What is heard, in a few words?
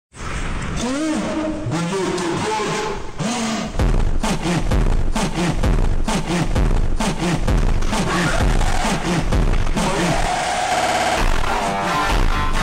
funk super slowed reverb